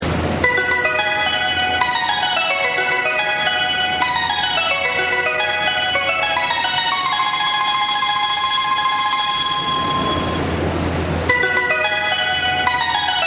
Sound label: hassya; kozu